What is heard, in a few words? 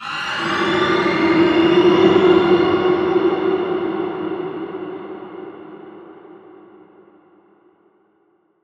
Atonal Depths, Psytrance